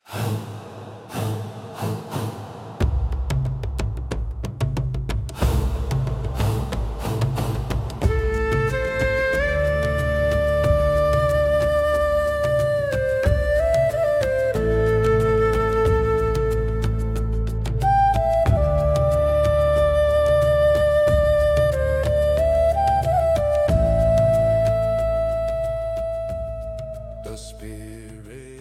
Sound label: Shofar Calls